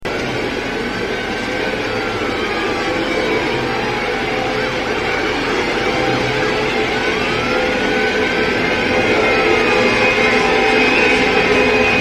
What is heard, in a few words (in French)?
Bruitage Angoisse